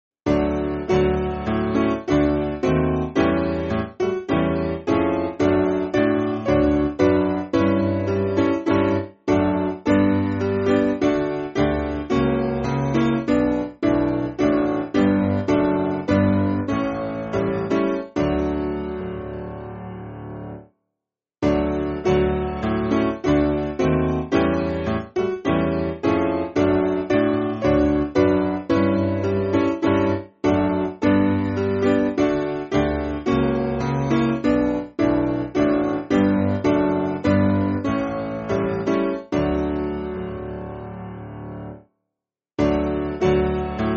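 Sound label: Piano, Music Backing Files for Churches; I Heard The Bells; Waltham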